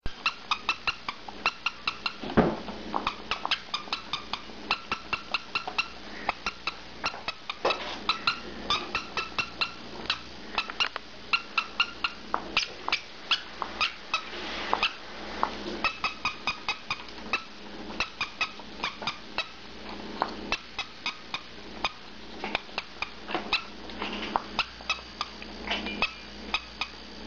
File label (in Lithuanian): cypimas cypsejimas cypcejimas rekimas, ferret